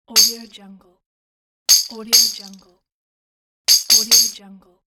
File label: tambourine percussive hits; applehillstudios